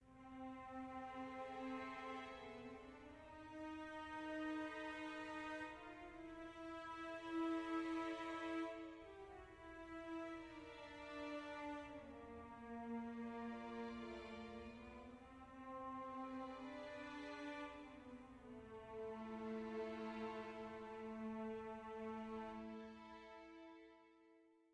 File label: dark soft; Quivr; PS4 Windows gamerip 2016